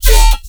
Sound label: Bass